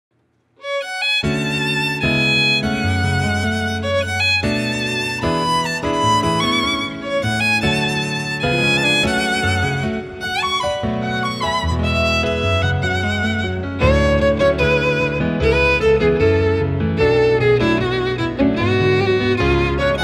Violin Cover; Naruto Shippuden

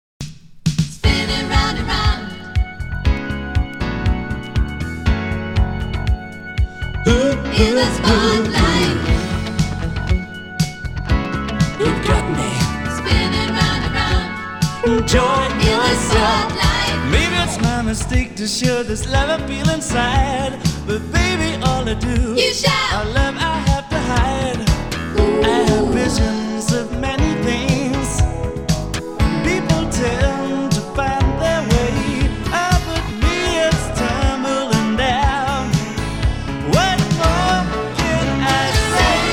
modern soul boogie, funky

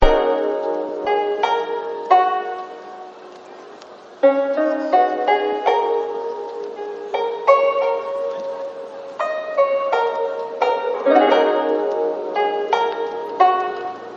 Japanese Rhodes

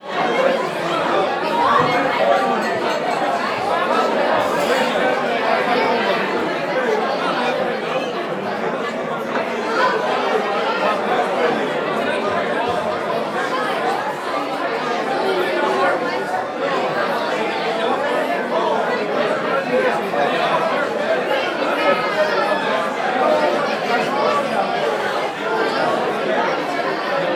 Large Bar Crowd Ambience

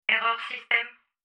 robot

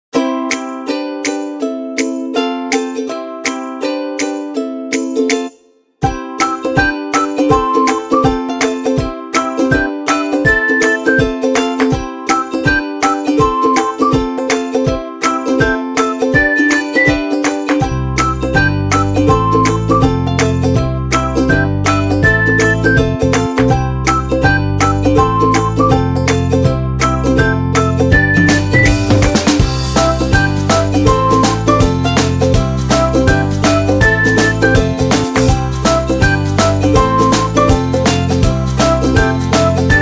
cheerful tune

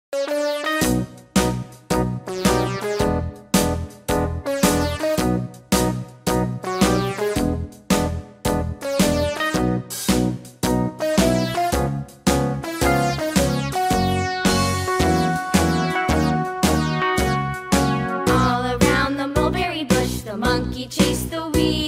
Pop Goes The Weasel, Música para Niños

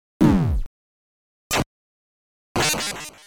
Defeat

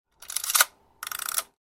Old film camera sound

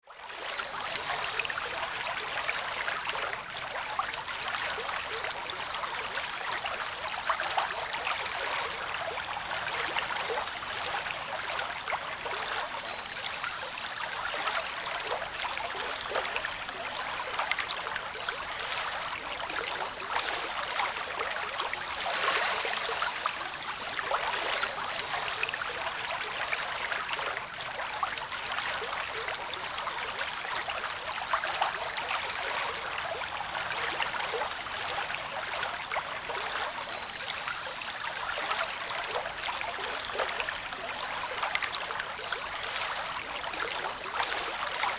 bubbling brook small; salamisound

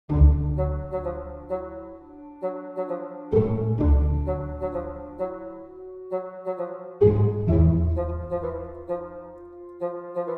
Cartoon Music Comedy Background Music

